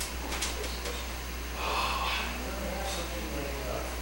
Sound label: labor breath